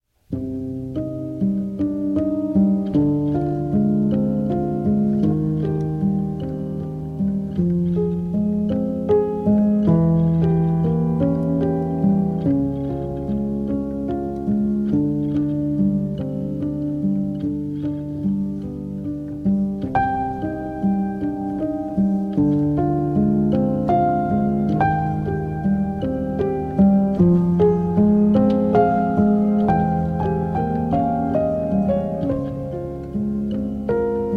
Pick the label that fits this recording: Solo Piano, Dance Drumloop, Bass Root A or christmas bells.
Solo Piano